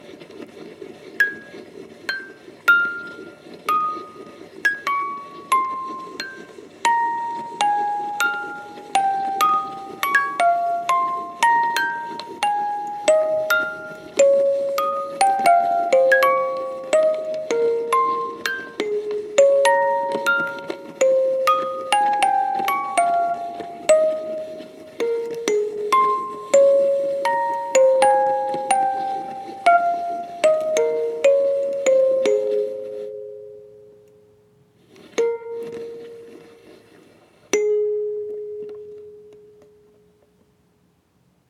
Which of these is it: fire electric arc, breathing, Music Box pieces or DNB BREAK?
Music Box pieces